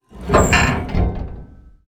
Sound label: Upper Door Close